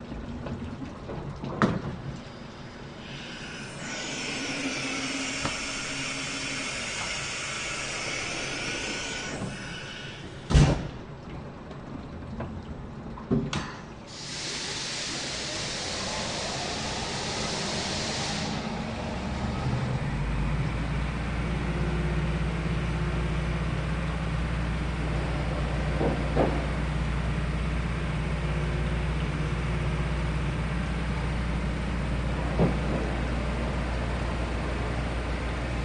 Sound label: Mill Machine Tool Ch, milling